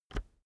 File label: keyboard external enter push